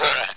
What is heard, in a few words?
pet burp